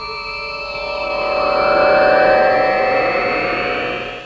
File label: teleport, electronic sounds; Sound Effects for Multimedia - Volume 1